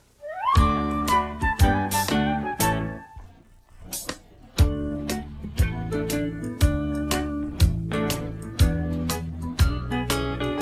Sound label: just singing a song music